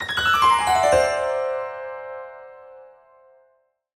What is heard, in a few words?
Mystery Arp; soundscrate